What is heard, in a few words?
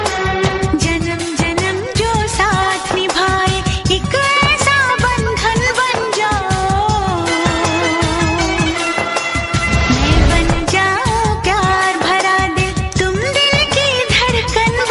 Best Old Ringtone